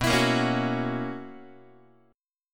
B Major 7th Suspended 2nd Suspended 4th, down, Guitar; strummed; 4A,4E,5A,5D,5G#,6C#; x,7,7,8,5,5; JGuitar